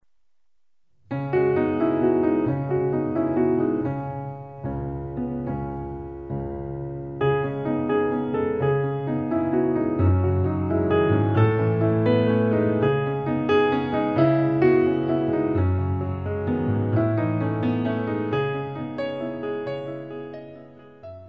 serenade

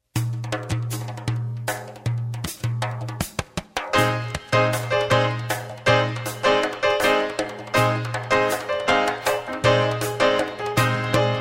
village dance

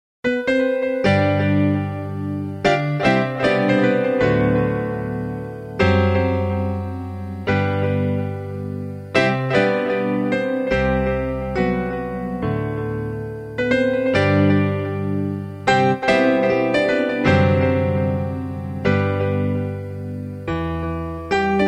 piano

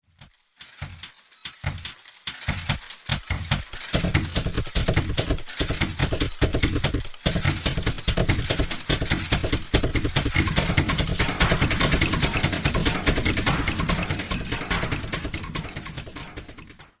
Conga & Co. jazz; Modern Jazz; Jazz Dance

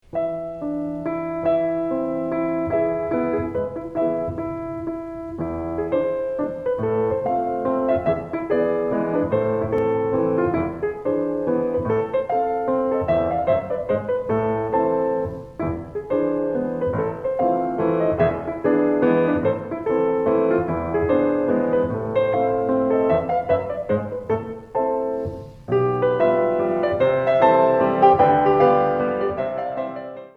pirouette; dancearts

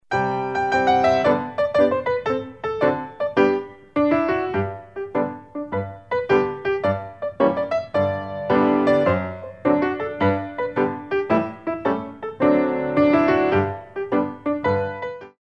jete

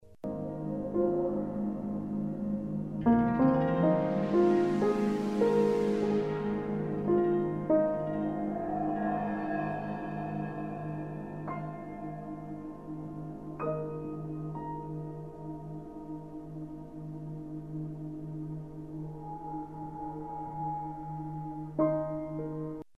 long meditation; Modern Dance Technique

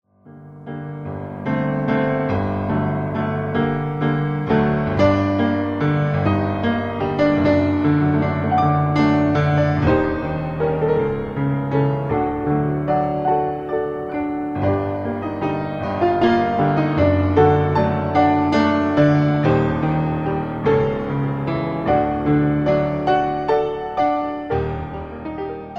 Floor Waltzes

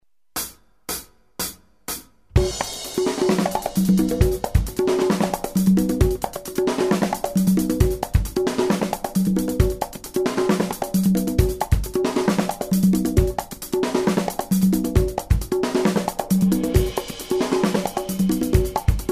drummers

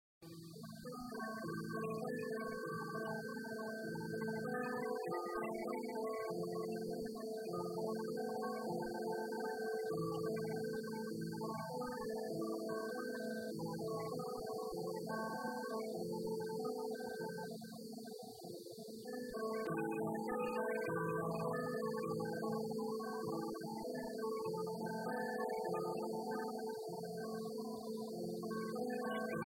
piano

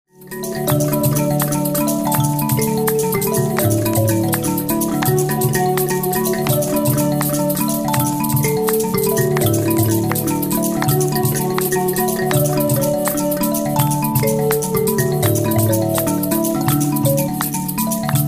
mbira magical

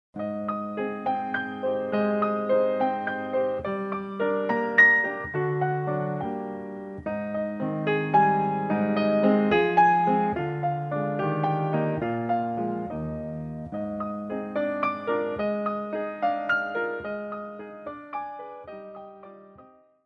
valse

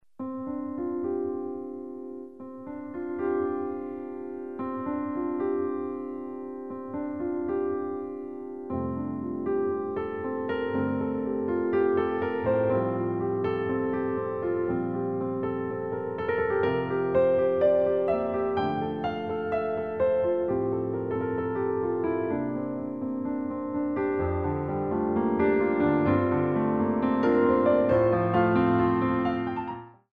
piano

